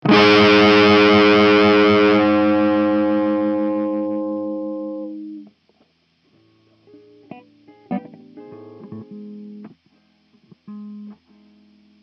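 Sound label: Notes Distorted G sharp major 11th Fret; Hylics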